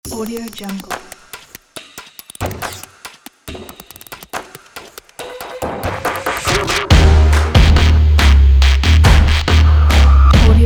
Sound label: stomp